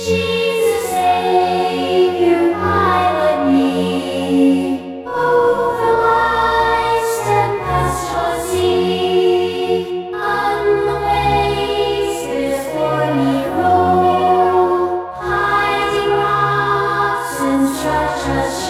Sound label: choir